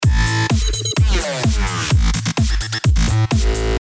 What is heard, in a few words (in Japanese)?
コンプレクストロ